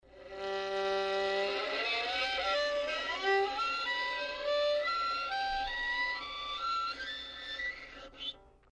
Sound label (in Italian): Glissando Armonici